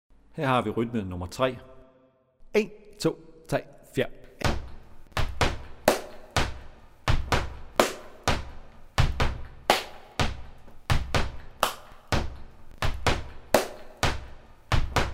stomp rytme stamp

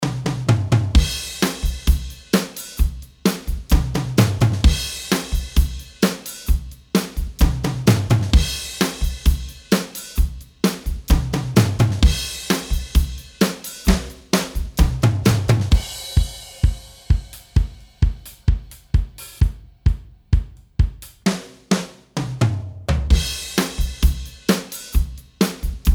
trommer

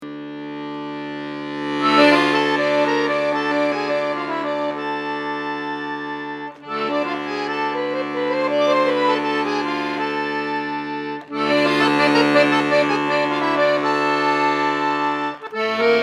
harmonika; eastwind

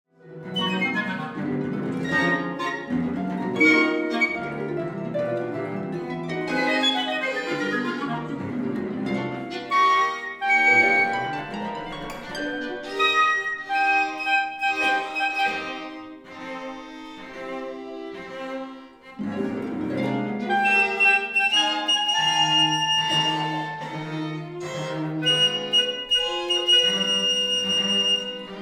ensemble instrumenter; ensemble storstroem

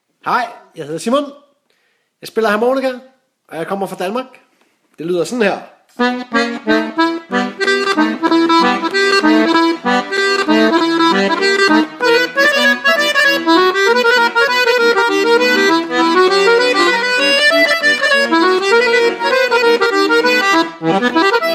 harmonika; prinscalibutsrejse

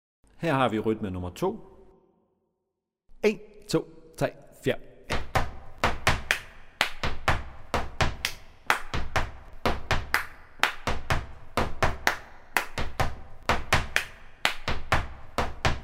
stomp rytme stamp

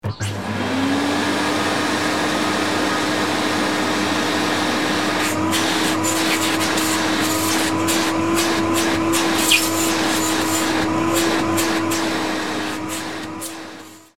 stoevsuger

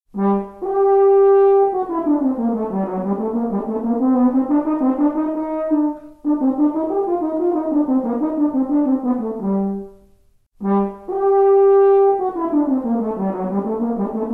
waldhorn

